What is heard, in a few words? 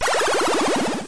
die; boulder dash